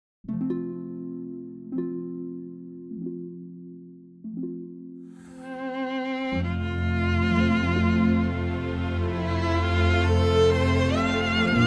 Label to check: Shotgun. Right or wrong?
Wrong